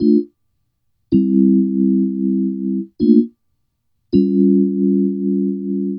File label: 170, C, Keyboards; Total Drum&Bass